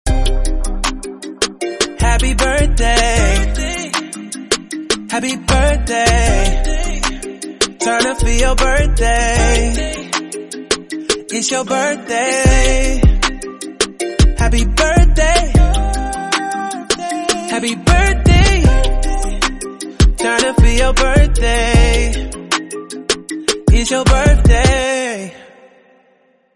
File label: Happy Birthday Song Instrumental Ringtone